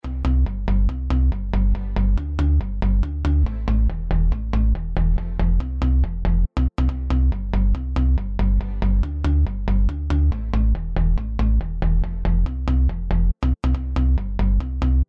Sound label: Bass House